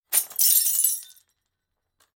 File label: SFX Break Glass; this is the zodiac speaking 2020 gamerip switch ps4 xbox one pc macos